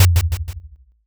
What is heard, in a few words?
taiko